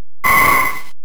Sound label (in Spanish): Grito de Kirlia